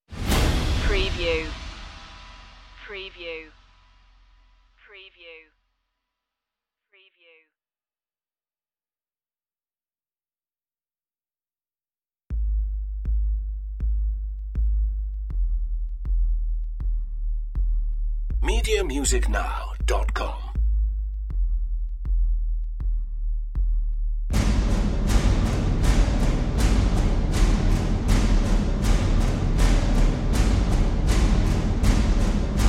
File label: the end of the world drums only